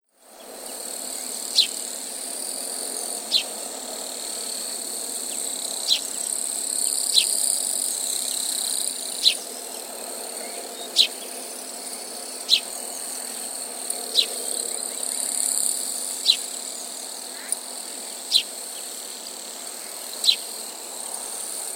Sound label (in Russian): kuznechik i vorobei